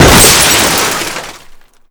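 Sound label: glass break automobile medium; Explosions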